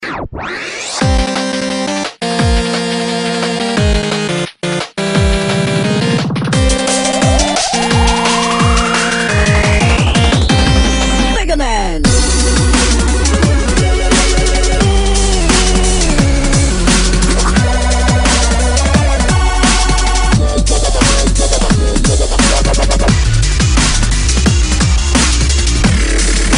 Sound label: 8 bit superhero